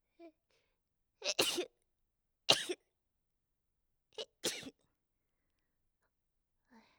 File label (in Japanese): くしゃみ3連発